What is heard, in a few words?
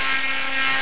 SCI-FI; E-MU Formula 4000 Series Vol. 3; Analog Odyssey; Electro Perc